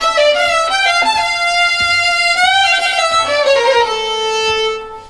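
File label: Balkanish Traditions strings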